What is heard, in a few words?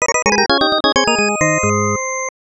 Unknown Jingle; Many Block Arcade